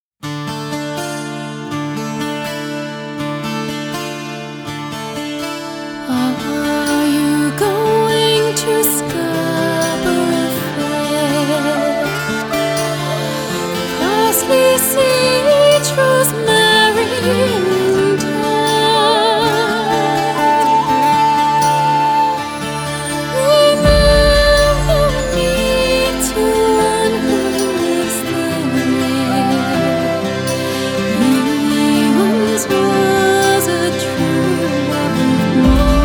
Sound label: acoustic version